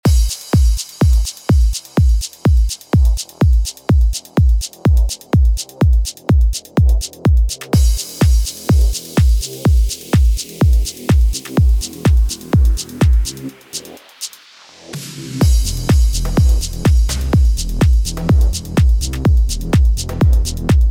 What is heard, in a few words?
groove